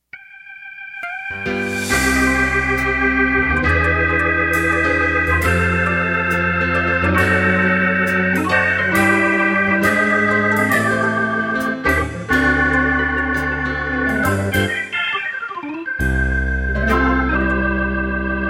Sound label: Gospel Rock Intro